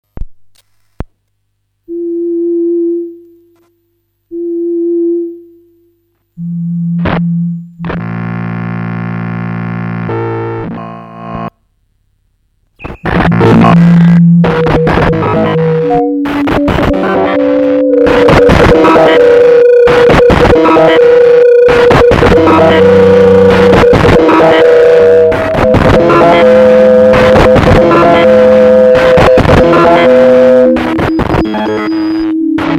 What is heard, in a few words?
Casio SK1, circuitbending; Gijs Gieskes